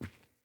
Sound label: Boot Walk Default, Footsteps; Characters; Enemy; Boots; Sinecure; Melee Grunt